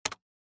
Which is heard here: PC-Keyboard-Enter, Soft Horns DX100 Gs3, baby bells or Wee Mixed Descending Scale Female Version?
PC-Keyboard-Enter